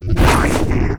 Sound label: WAR ATTACK, Mob